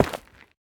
下界岩破坏声

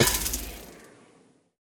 试炼刷怪笼破坏声

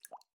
滴水石锥水滴落声

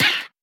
鹦鹉死亡声

